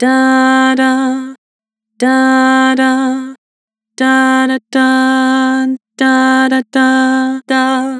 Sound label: Vibrato "Dah", Vocals; Harmor Vocals